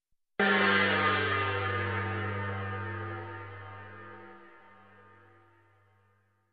Weird Gong Hit

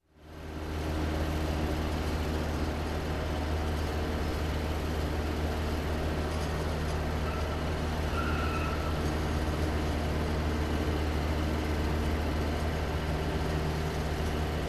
Truck Engine Noise Idle

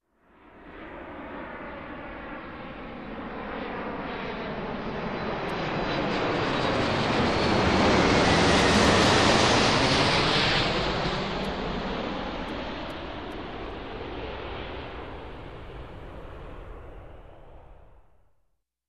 Jet aircraft flying over

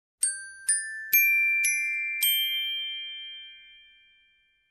Simple glockenspiel melody

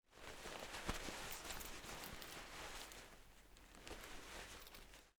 Rubbing cloth sound

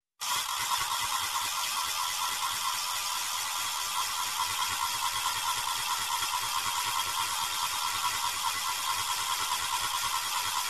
Spinning Sound

